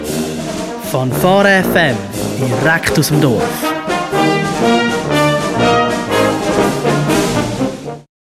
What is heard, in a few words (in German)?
Jingle; Live-Dorf; pfadi radio